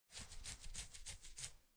Scratching Head, Human, Scratching Head, Scratching Hair; Miscellaneous Human